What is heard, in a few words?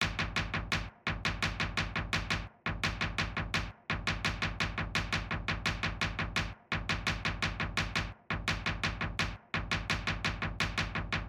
beat